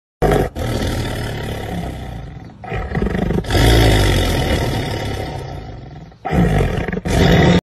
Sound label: black panther, پلنگ سیاه